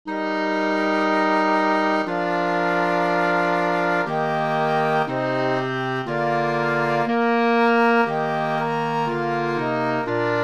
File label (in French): flutes clarinette; Moussorgski MP; Tableaux dune exposition; La Grande Porte de Kiev